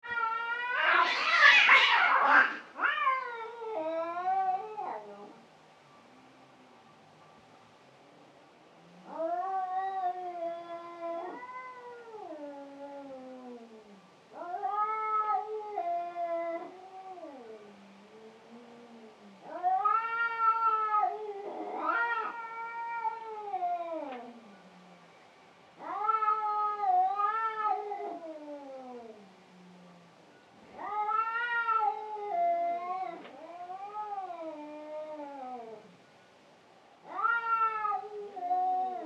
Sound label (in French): son bagarre chat combat